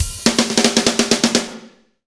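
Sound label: fast shuffle, Roland drum beats; Northstar - Drumscapes Roland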